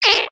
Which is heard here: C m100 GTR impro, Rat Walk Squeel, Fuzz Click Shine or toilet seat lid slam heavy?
Rat Walk Squeel